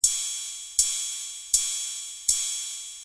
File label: cymbal; dr110; drums; cymbalx4